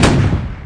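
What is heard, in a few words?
Gatling Shot; outlaws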